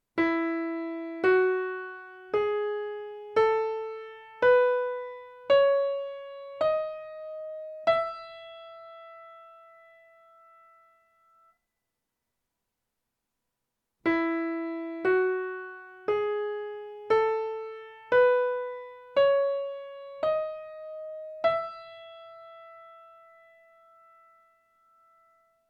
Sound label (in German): Tonleitern hoeren